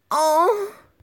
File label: Ahh